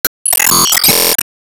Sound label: Time Up